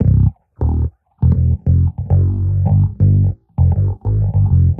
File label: Bassline 100 C, hiphop, 100bpm, Bass; musicradar